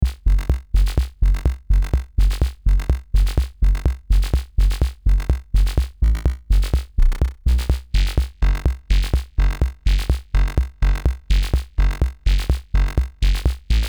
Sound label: Mix Bus Kick Boost